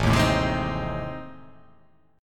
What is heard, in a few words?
A Minor Major 7th Flat 5th A